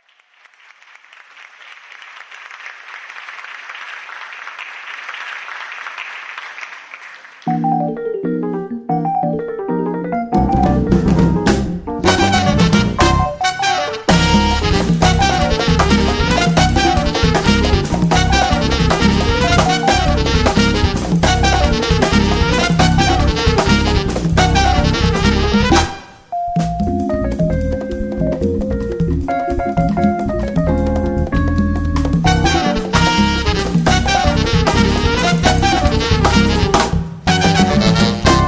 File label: Rumba Piano; Bosch; Bellas Artes